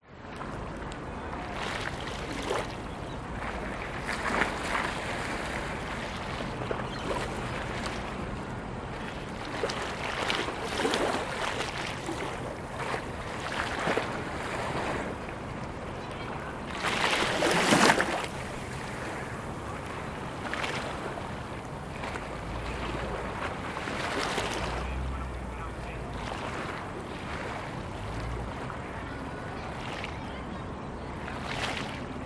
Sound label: public places park botanic gardens sea wall waves lap distant city hum few people; sydney